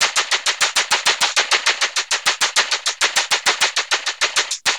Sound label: Vocobeat; Sonic Foundry (Sony Creative Software)